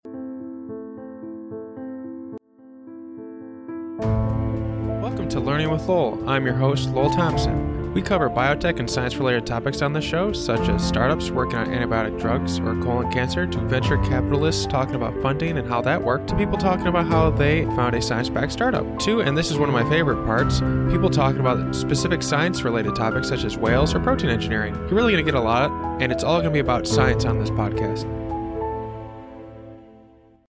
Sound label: intro music with sound